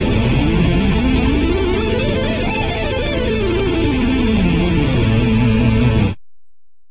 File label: aeolian fast